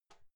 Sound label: cupboard open